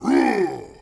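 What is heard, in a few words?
Attack Vox; Sid Meier's Civilization 4 Complete; Warlords; Units; Omen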